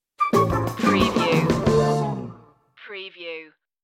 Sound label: cool cop stinger